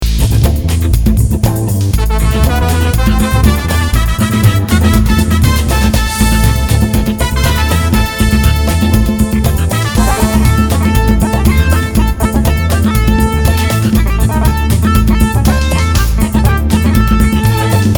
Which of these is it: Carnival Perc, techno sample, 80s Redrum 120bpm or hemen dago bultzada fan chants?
techno sample